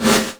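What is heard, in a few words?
Gate Reverb, Boss DR660; drum machine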